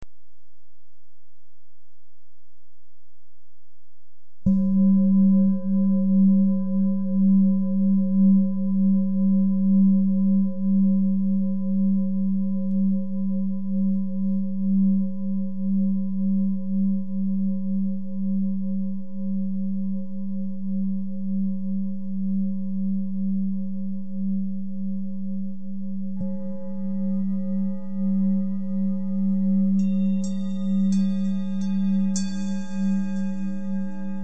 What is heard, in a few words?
tibet